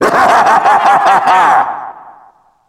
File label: ahahaha; ffxiv